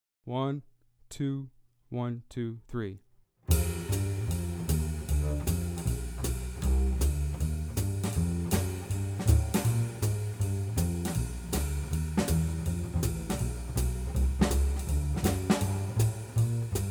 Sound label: groove blues play along; all state auditions